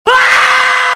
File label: screaming